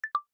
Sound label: Disconnect; Tecno; Pop 9 4G